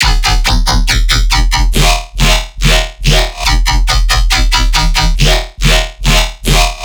Riddim Bass Loop - E 140; Double Design; Ghosthack; Riddim Essentials Volume 2

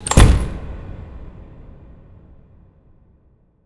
impact hatch; Hits

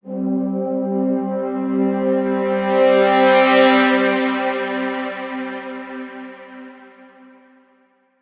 Orch Brass Swell - Cm 65Bpm; One-Shots; Musical One Shots; Ghosthack; SkyRealm

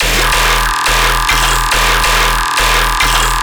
Bass Loop E 140BPM, Dubstep; AC2024 - Day 13 - Dubstep Sounds 2025; Glory Bass

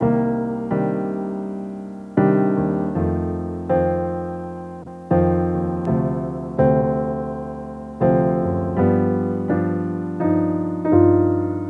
Piano Dry 82 BPM A# Maj; Melodics; Cymatics - Eternity Sample Pack

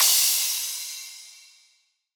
Crash; Cymbals; Cymatics; EDM Starter Pack; Drums - One Shots

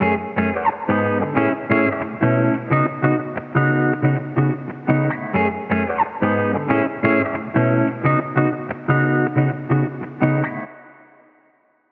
Electric Guitar Wet - 90 BPM C# Min; Melodics; Cymatics; Eternity Sample Pack; Guitars - Electric

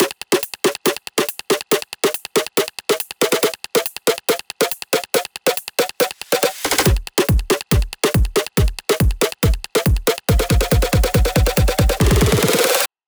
Buildup Drums 140 BPM, EDM; Loops; Cymatics; EDM Starter Pack

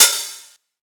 Open Hihat, Techno Drum Shots; Ghosthack; Free Techno Drum Shots 2024

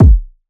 Kick - D; Drum One Shots; Cymatics; Cobra Hip Hop Sample Pack

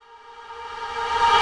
Unison Tonal Reverse - A#; House; FX

